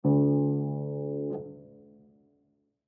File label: Piano Push Key, Piano Push Key Low Note Release Key Click; Pianos; Musical; Blastwave